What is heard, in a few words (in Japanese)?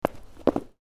kutu; easyrpg; Ib